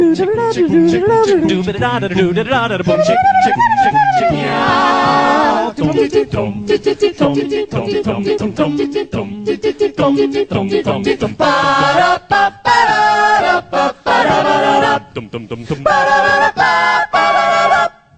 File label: acapella; simpsons